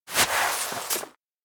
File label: slide on moss kigurumi; anemoi